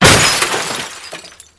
car glass; Mafie 1

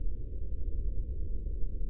ambi in; Mafie 1

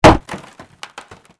wood; Mafie 1

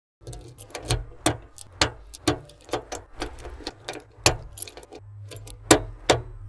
car unlock; Mafie 1

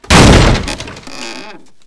hit; Mafie 1

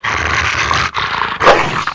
dog; Mafie 1

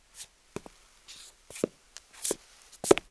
steps; Mafie 1 cz

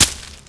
bush; Mafie 1

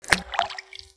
water; Mafie 1

